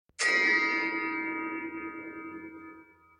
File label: jumpstyle sound